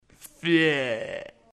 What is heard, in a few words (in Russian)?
paren feee